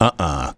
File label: "uh uh"; Postal Dude Voice Lines